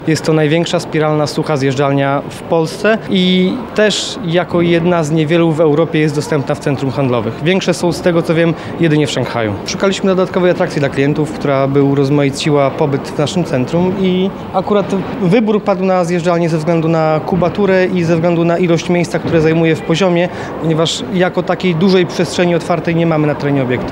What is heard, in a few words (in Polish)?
tuba